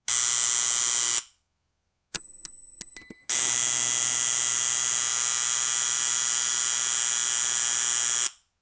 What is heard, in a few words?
defibrillator electrical